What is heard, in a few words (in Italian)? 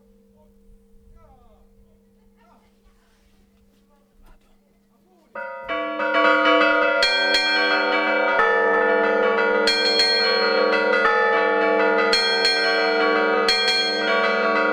Campane; Glori; Imperia; Archivio Balma Campane Liguria